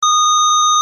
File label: Beep; Museum Alarm Options